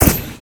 vehicle spacesuit thruster start

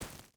footstep barefoot gravel run; Rust

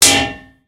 tank hit small; tanks; War Thunder

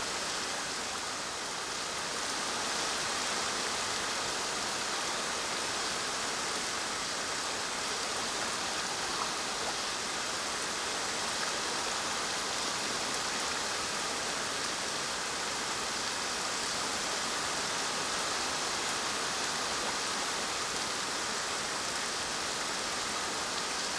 ambience coniferous forest night calm rainy; Rust

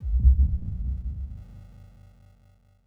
deselect

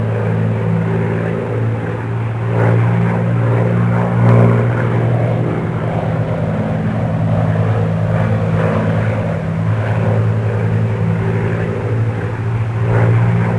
Fighter Patrol; Civ4 warlords

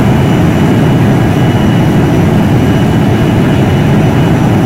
me163 on high, engines; War Thunder

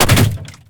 bullet hit inside; War Thunder

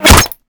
bullet impact body armor stereo; weapons; Modern Warfare

